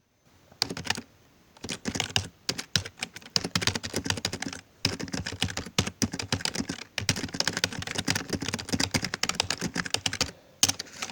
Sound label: Keyboard Typing Text